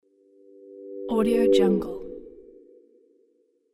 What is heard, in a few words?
electric sound